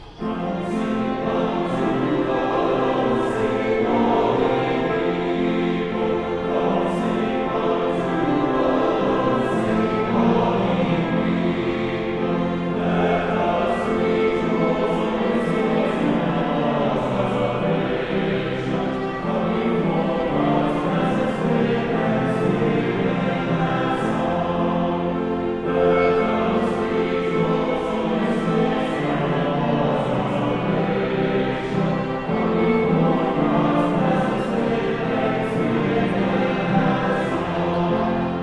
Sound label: Choral warmup